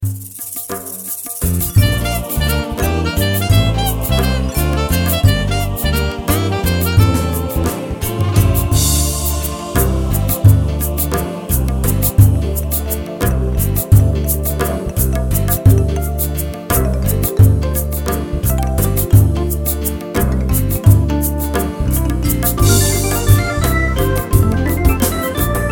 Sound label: Ethnic 5-4; AjamSonic